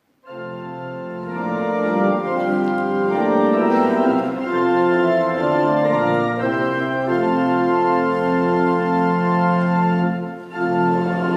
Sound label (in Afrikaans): Gemeentesang